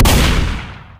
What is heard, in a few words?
auto shotgun fire; Left 4 Dead; Source Engine